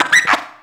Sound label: HUHEEHUH; Rarefaction - Poke in The Ear With A Sharp Stick